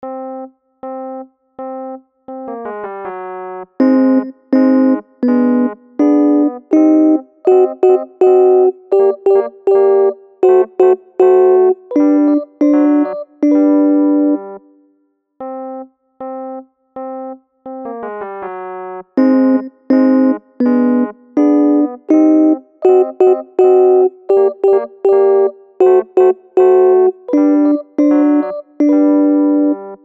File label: midi alto